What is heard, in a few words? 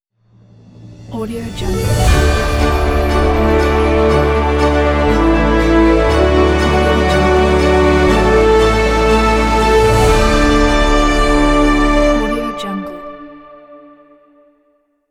opening fanfares ident